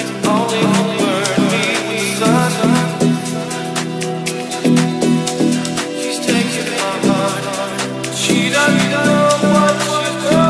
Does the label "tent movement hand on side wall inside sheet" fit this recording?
No